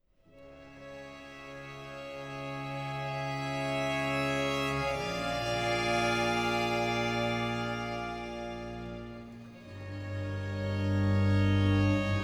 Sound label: Concerto for Strings in D Minor, Adagio